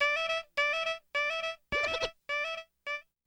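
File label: brass, E minor, Hip Hop & RnB; ProSamples vol.34 - Hip Hop & RnB 3; Sexy Susan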